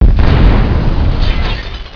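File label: EXPLOSION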